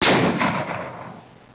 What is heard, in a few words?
gunshot